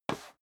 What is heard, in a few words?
step sneaker metal dry slide short